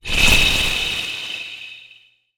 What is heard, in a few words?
Sci-fi Transition